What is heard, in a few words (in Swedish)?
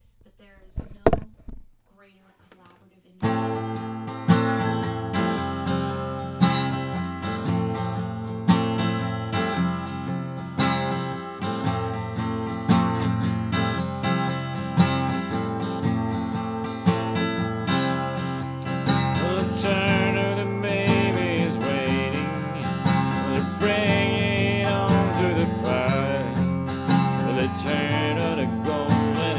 skitbra country